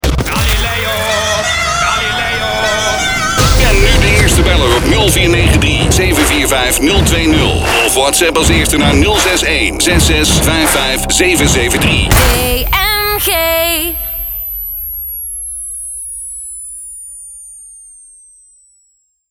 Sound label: ALARM